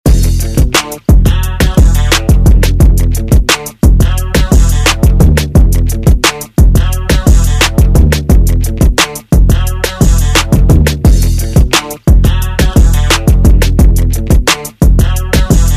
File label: Top40 Redrum Clean